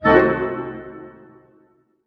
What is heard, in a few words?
HHIT C DIM, HARP HITS; Sound On Sound - Peter Siedleczek's Orchestra